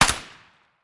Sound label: m9 silenced fire, beretta92fs pistol; garrysmod